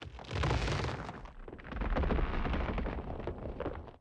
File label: cemetery; portlux